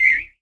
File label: New Beep